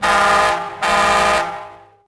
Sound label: Train horn; Star Wars Obi Wan; XBOX